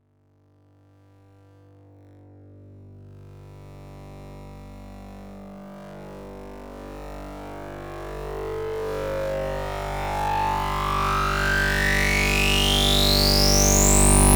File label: uplifter